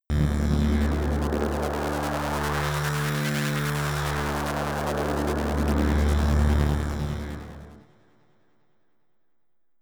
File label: serum morphsound